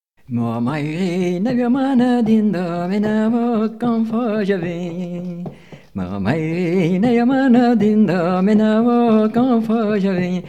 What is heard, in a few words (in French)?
Bourrée; Ma Maire Navia Màs na Dent